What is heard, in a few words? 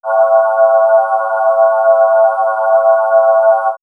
Swirls, pads; TheVectorist; X-Static Goldmine